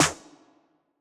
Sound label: twig snare; nova sound